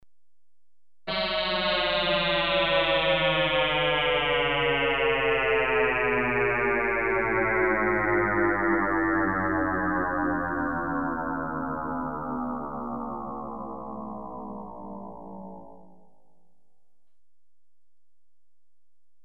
Space craft lands, Electronically Generated Sounds; Sound Effects Library